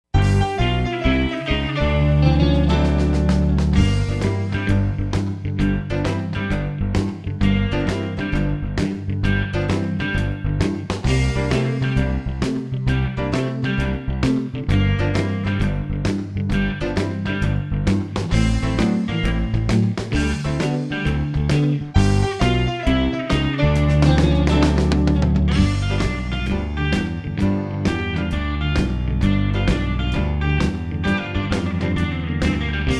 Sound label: Blues, full mixed